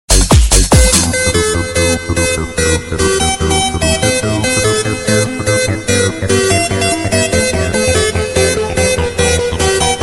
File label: alarm tone ringtone